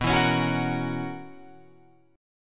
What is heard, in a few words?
B Major 7th Suspended 2nd Suspended 4th B down Guitar Open F; strummed; JGuitar